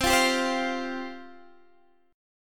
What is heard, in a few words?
C Suspended 4th C; Csus4; strummed; x,15,15,12,13,13; chord shape